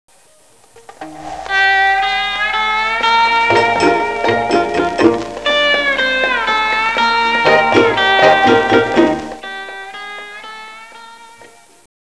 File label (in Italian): musica strumentale